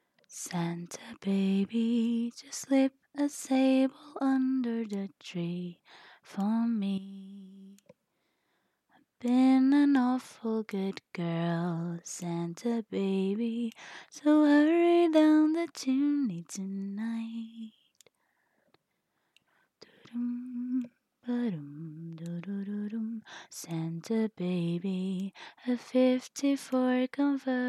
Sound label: singing, sick, christmas song